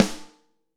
HS snare Hard Tease

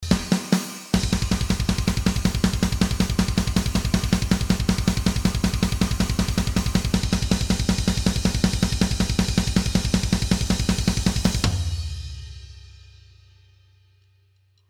Natural Drums, raw snare samples

